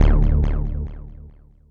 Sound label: Synth Hit D; Zero-G Trance Inducer; 90 s Sample CDs